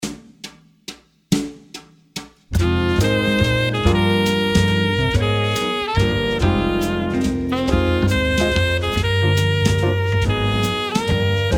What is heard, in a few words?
Afro Blues